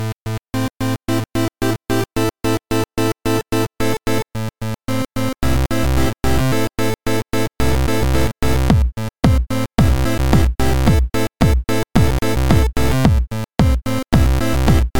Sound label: CV4 Pulse, poly Synth